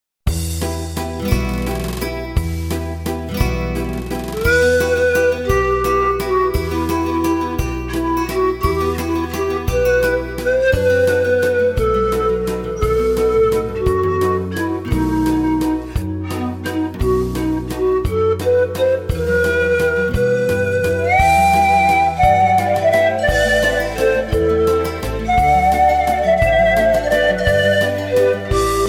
vals

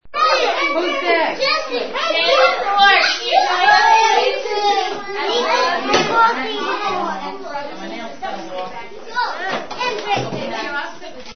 Aula, school

